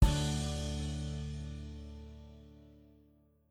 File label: wand Out